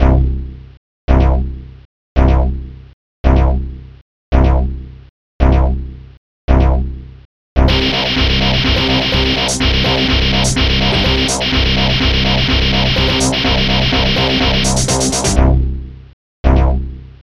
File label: pulse; Digital Symphony Collection; cyber